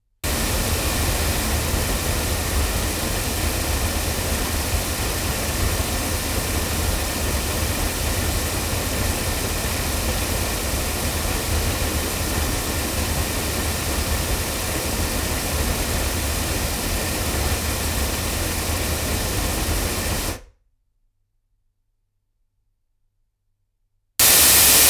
ADK A51S Model S loudspeakers, microphones recordings